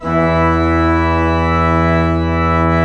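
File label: pedal E2, organ pedal; AKAI S-Series CD-ROM Sound Library VOL-2; ORG PDL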